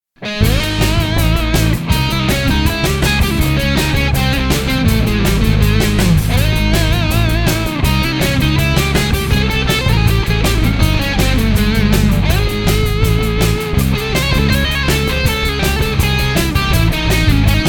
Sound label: double harmonic major